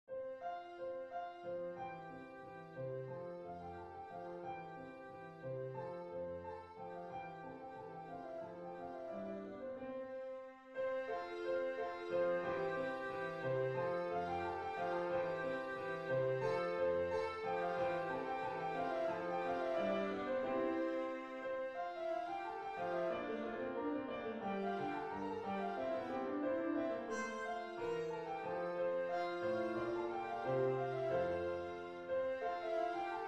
gavotte majeur; Arcangelo Corelli

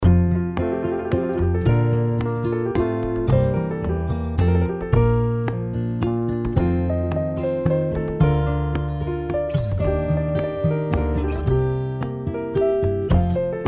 groove printemps

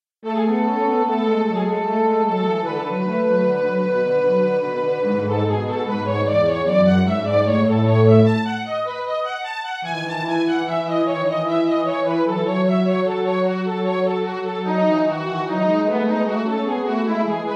cordes; bach johann sebastian; 15 inventions a 3 voix

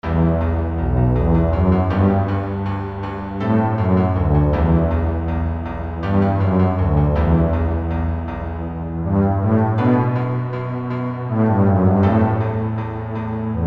duo contrebasse piano; chauveau bruno; jour aujourd hui

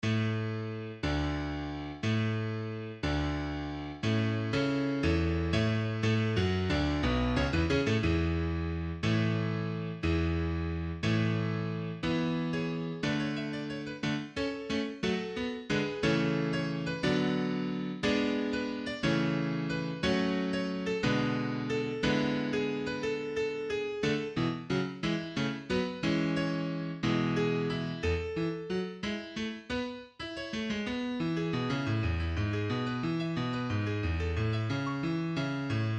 etude partie piano; kreutzer rodolphe

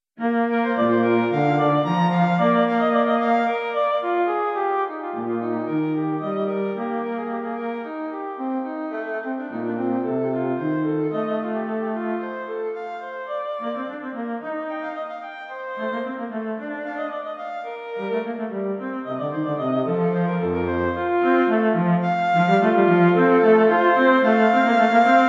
cordes; bach johann sebastian; 15 inventions a 2 voix n 8

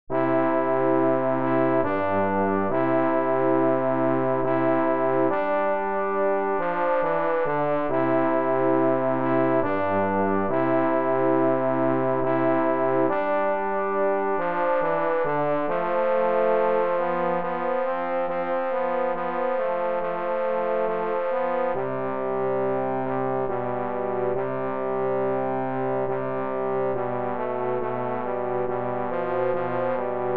duo pour trombones avec accompagnement

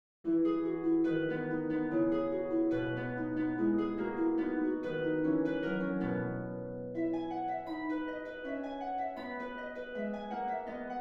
gavotte majeur; corelli arcangelo

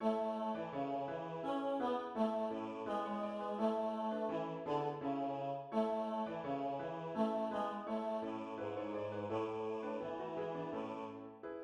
basses; deck the halls; traditional

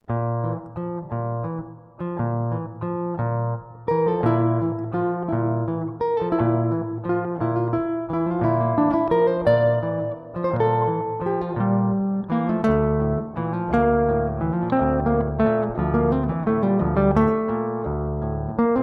valse

